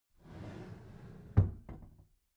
packed drawer smooth clatter close soft halfway

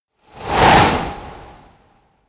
sweep

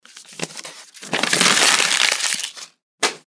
paper crumpling and throwing away

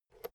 fader single slide click

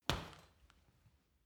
punching bag kick soft

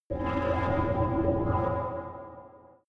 space machines working hall

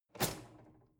drawer cutlery close draw short soft

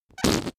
whoopee cushion fart

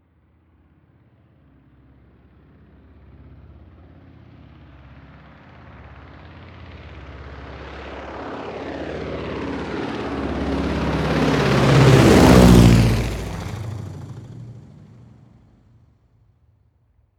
propeller plane ju52 fly by overhead from front

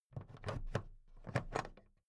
plastic clasp handle open soft

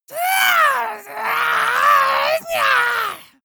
monster scream high

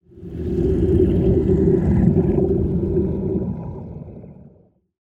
monster breath

